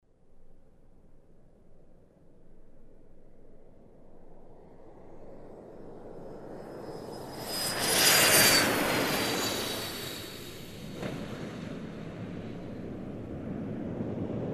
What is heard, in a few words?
Aircraft Soaring whirring sound